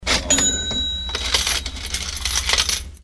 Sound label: money sound